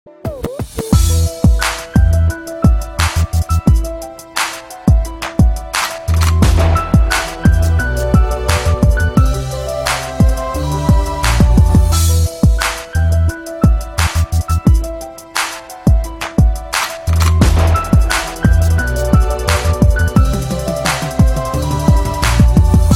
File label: sad tone, ringtone